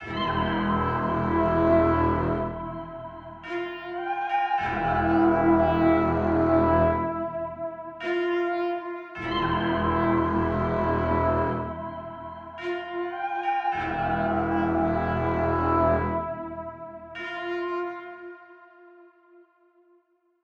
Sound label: 105 bpm E Minor, sinister music loops; The Reckoning